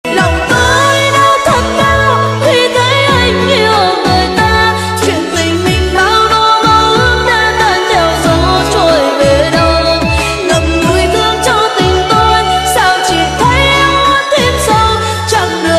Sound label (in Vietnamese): nhac chuong tiktok giong nu cover